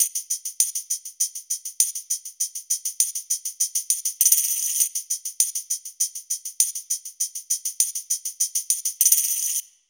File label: SKRAMMEL TAMB